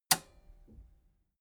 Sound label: metal button robust spring release